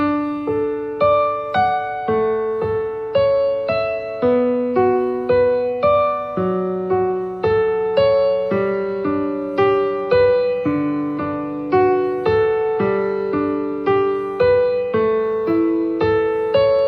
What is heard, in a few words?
canon D; basis fuer popsongs